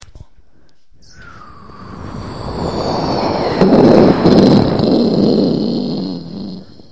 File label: shitty airplane sound